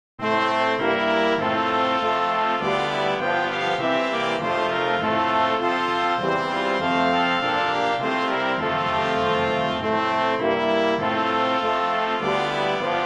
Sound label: Hymn BRASS